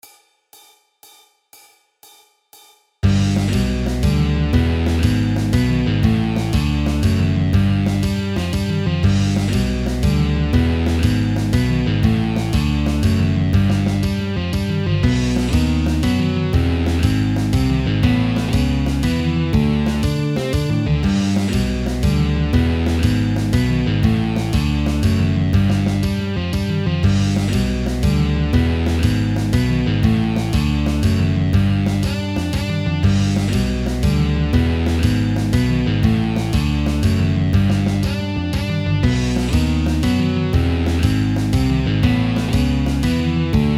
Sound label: dutch folk song, guitar